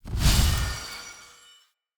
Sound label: Smoke Burst; Verminia